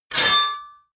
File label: sword cut hard clank